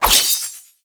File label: Spectral Sword Slash Start D; Ultimate Abilities; Naramon; Warframe; Focus